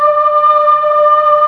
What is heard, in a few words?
BOYS D4, BOY C; MEL BOYS